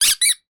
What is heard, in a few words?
Squeeky Ball Toy